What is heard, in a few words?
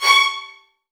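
VN FX C#3 right; ILIO; Kirk Hunter Virtuoso Strings VOL-1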